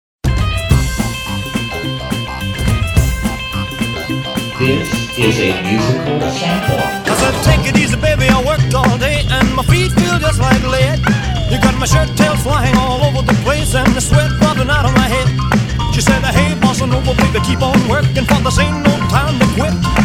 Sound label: Bossa; sound alike tracks